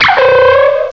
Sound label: sawk, cries; direct sound samples